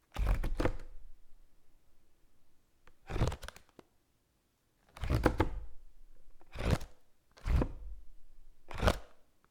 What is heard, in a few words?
Japanese Fan